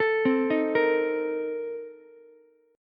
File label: A sharp Major 7th Suspended 2nd Suspended 4th, Ukulele; arpeggiated; JGuitar